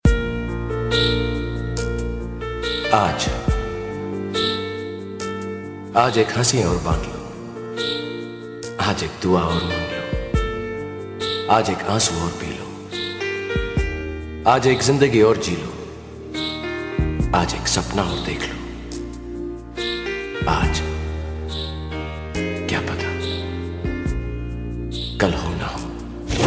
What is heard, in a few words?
Heartbeat; Srk